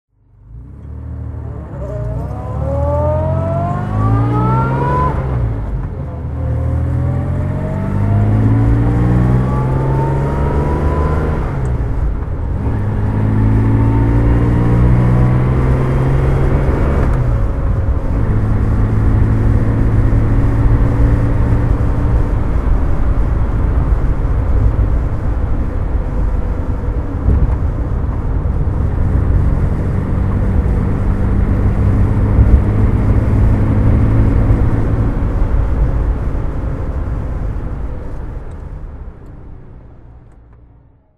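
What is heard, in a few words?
jaguar xk150 DHC drive, engine sound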